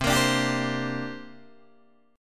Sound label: C 7th Suspended 2nd C down Guitar Open D